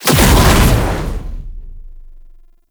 Summon Clone; Warframe; Uriel; Demonium

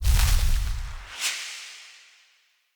Blind Cast; Fey; Saboteur

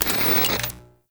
TV Turn On; Arthur; Warframe; Cinematics; Hub

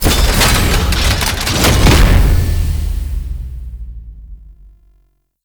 Window Shut; Warframe; Tau; Betrayer

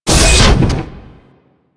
Leech Flaps Open; Leech Osprey

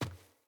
Sandals Land Dirt, Footsteps; Player

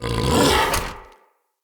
Battle Dog Attack Bite; Animals; Vocals; Snc Battle Dog

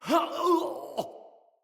Death, Soldier Male; Dendrit

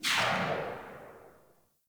Fire Tail, Weapons; Warframe; Burston

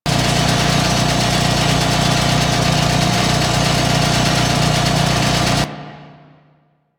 Grineer Elevator Run Loop; Warframe; Doors; Elevators; Generic Elevator

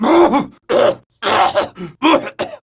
Coughing; Stoic; Warframe; Evacuation

